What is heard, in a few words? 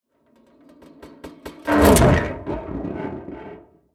Cinematic metal whoosh